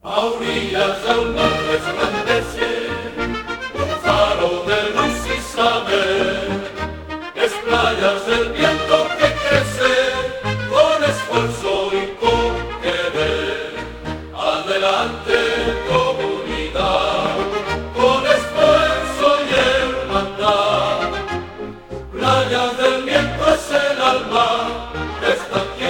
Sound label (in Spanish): himno; playas del viento; simbolos